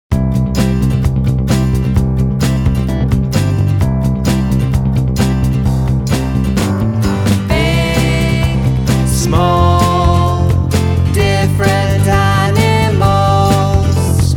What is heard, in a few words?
SONG Tick-Tock Tick-Tock